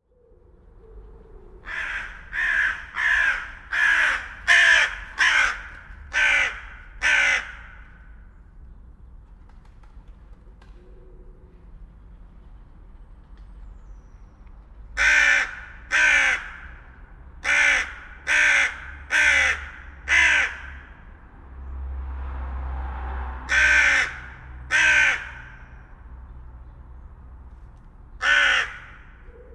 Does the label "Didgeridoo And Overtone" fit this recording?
No